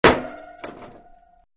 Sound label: mine impact; homing; happy wheels